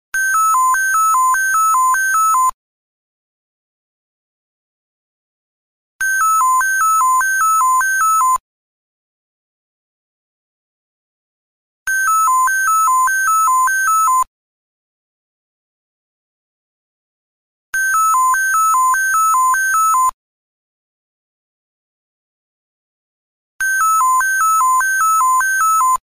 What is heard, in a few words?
Ring Nextel